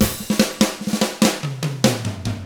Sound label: rock 6/8, drums; ILIO; Double Platinum Drums 1